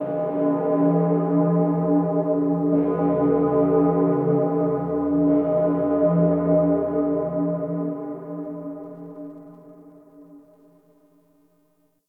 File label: Church Bell Ring Clear; Warframe; Clan Dojo; Dagath